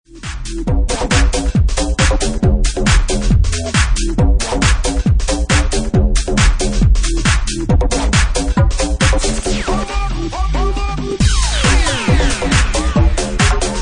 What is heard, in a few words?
Bassline; Bassline Meltdown Session 1